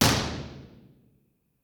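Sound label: impulse response stereo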